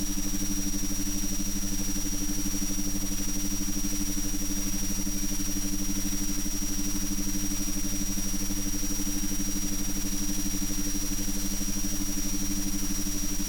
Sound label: insects